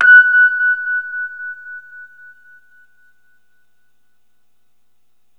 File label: F#, Rhodes; 3D Sound; 3D Keys VOL-1